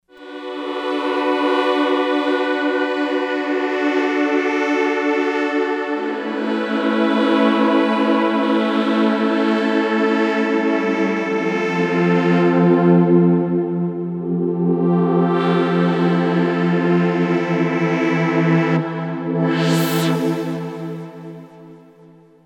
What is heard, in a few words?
Symphonic, Novation XioSynth